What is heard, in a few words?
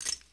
amb lounge misc; Earth and Beyond; EA Games